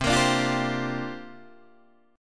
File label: C 7th Suspended 2nd Suspended 4th, Guitar, Open D